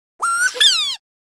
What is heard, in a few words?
yeehaw